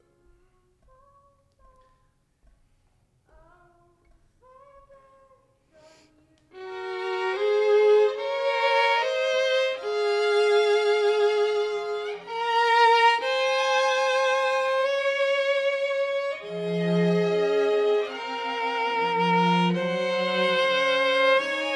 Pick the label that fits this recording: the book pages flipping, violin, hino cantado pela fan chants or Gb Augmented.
violin